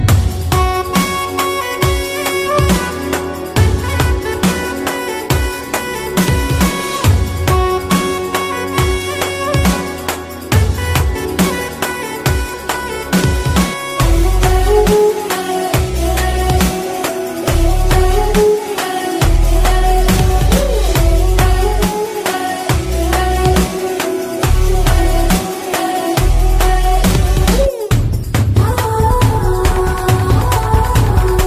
Instrumental Tone

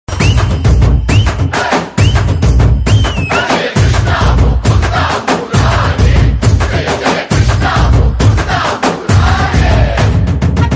Drum Beats Tone

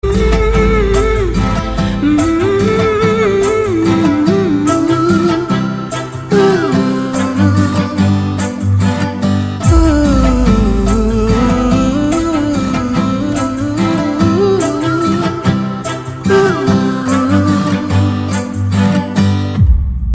BG Humming Tone

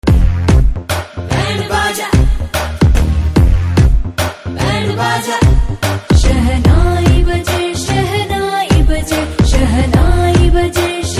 Band Baja

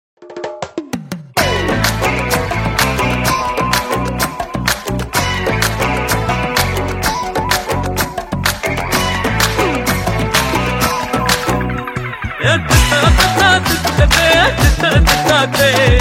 BGM Theme Ringtone

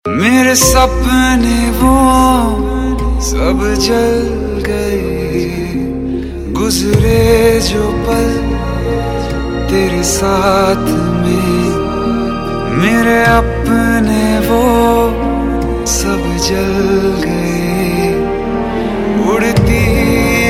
Sad Version

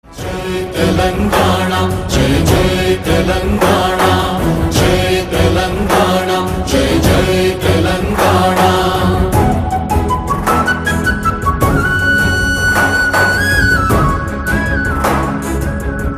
Flute; Jaya Jayahe Telangana

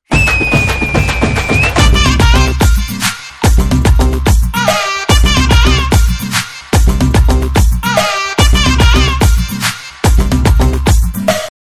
Mass Beat Ringtone

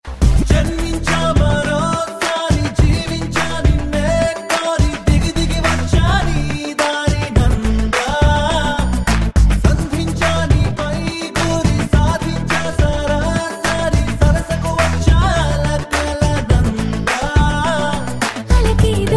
Male Ringtone

